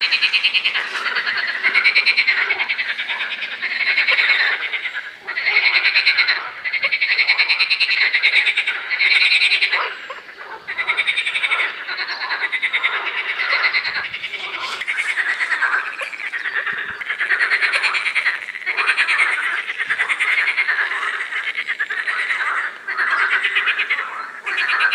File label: frog choir; Vasili